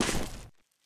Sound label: grass, footsteps; Counter-Strike Global Offensive